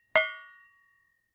Metal Clank Hitting The Lid Of The Pan

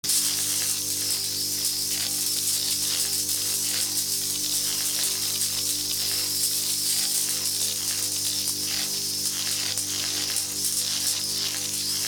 Electric Hum And Buzz With Sparks Designed

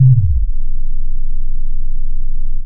Subfrequent C1

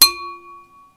Lamp

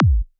Psy Kick

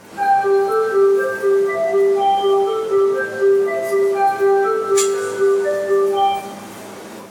Yamanote Line Jingle Tokyo

